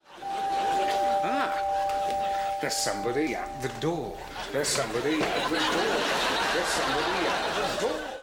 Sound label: door